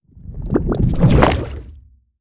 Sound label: skill swallow